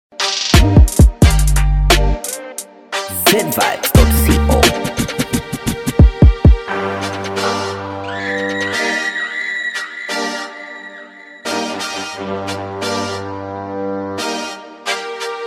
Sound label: Free Beat